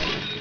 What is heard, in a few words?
cash; 80s; Midway Games; Smash TV